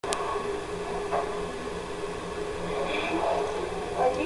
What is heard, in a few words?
A Little Girl Breathes Into The Recorder